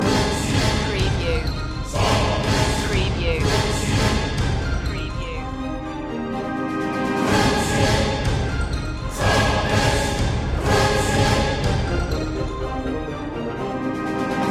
diabolical machines loop

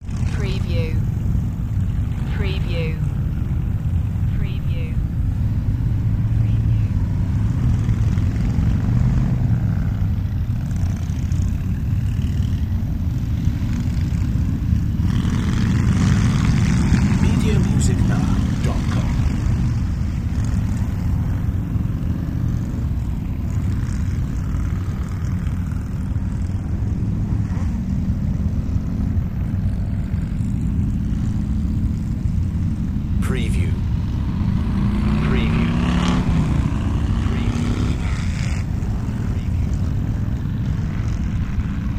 Biker Gang Distant

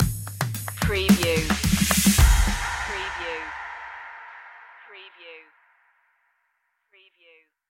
that feeling stinger

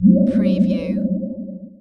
Amb process

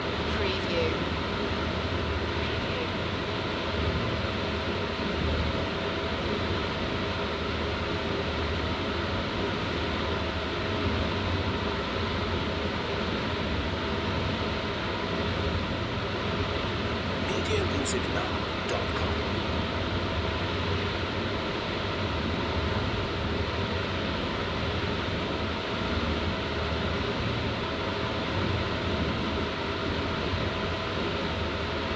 courtyard air condition loop

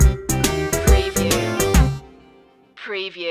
retro video game blues stinger

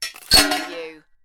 Metal Junk With Chain Clanks Rattle

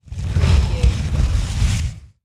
Flame Whoosh

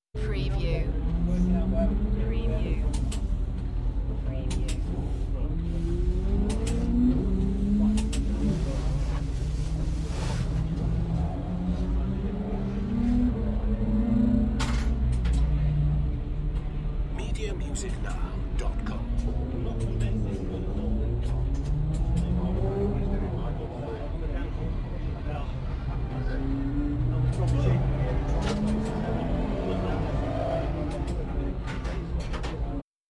Rally pit ambience; beanstalk audio

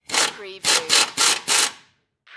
Air Gun Operate Short; sound effects bible

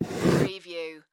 Jewelry Box Drawer; Sound Effects Bible

